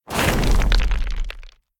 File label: rockslide; Smite; Cabrakan